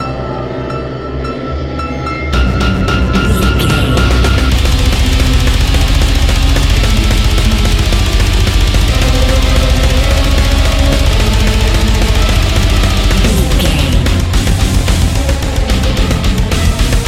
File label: full speed madness